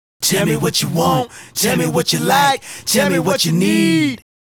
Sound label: Male Group, Hip-Hop and RnB Vocals